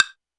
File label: woodblock hi; Drums; Ableton Foundation FreePacks